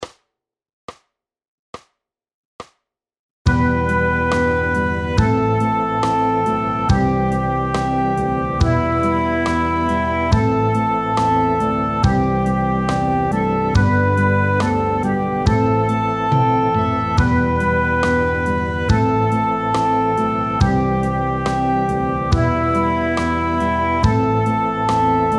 abschieds song playalong

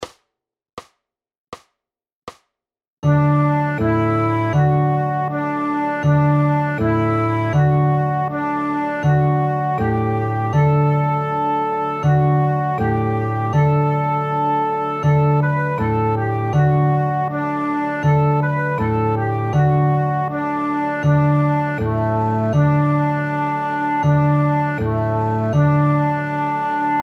Bruder jakob playalong

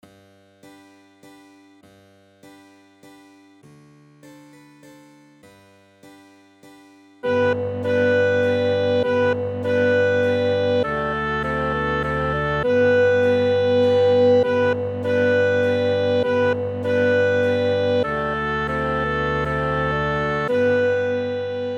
Menueett fuer klarinette

